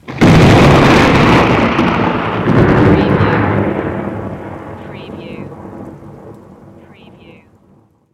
thunder crack very loud

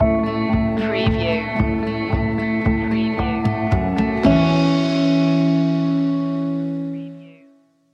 walking home stinger

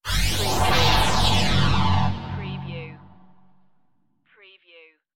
laser strobe pulse

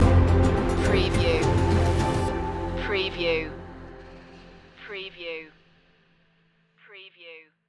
eastern promise stinger

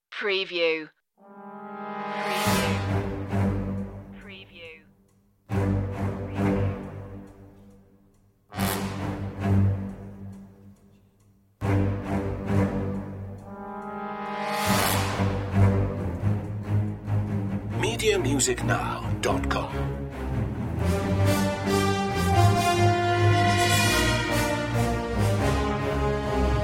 strings brass

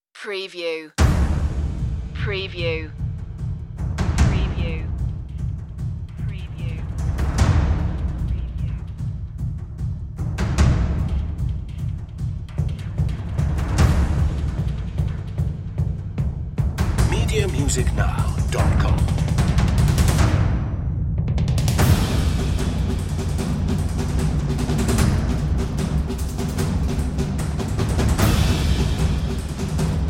percussion only version

